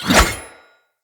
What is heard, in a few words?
Secondary Dagger Melee Whoosh Heavy; Weapons; Player